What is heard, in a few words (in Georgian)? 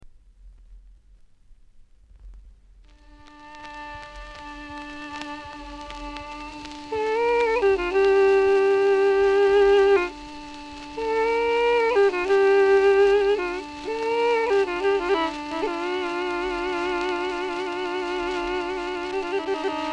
melodia dudukze